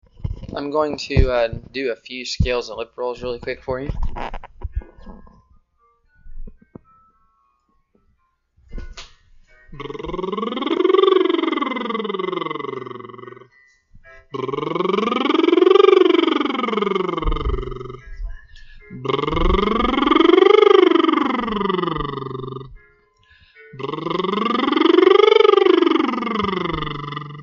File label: Lip Rolls